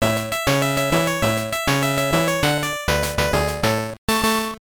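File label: Jingle, Super Game Boy; Kingyo Chuuihou 2 Gyopi chan wo Sagase; GB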